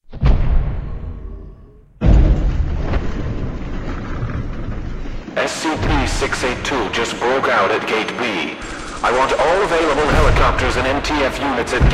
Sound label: Battle; Ending; Gate B